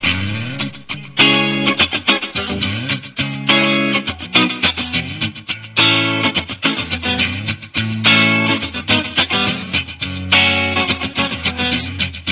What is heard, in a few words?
flex clean